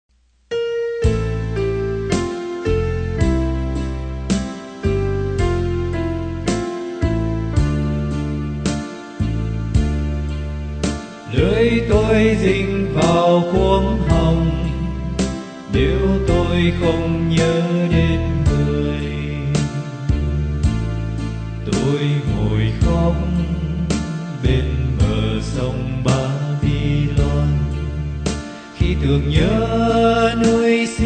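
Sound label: soprano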